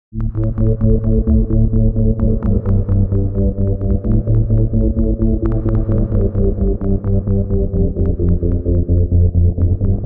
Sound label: Chirps